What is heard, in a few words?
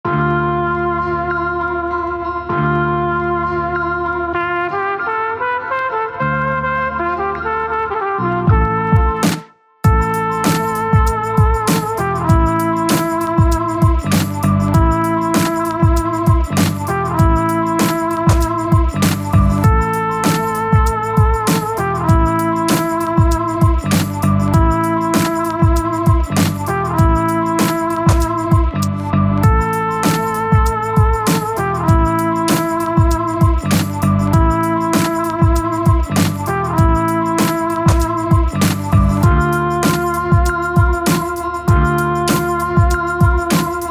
Blues